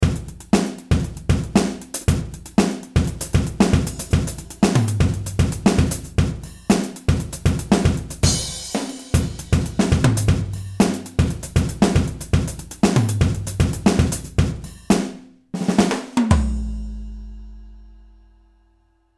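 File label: Funk DLX Yamaha Pro, drum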